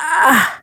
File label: pain; awesomenauts; Dizzy